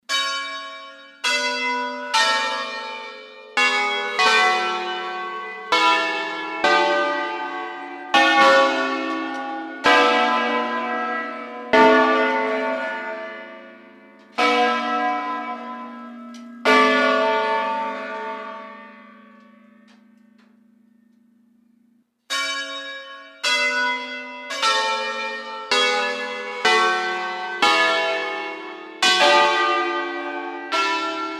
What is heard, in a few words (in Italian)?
Campane a scala